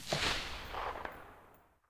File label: scar silencer mono very far